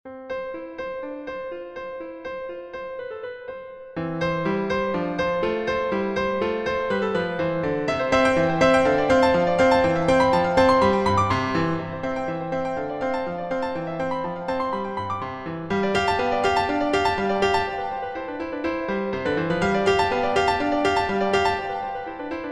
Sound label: Piano Pieces Fughetta